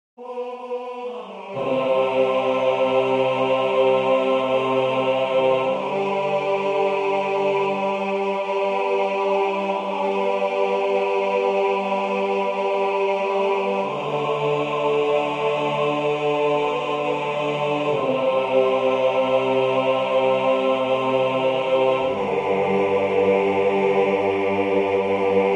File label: bass